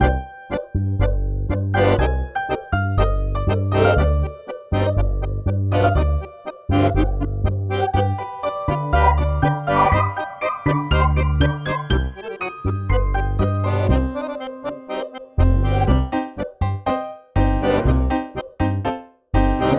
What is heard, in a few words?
tango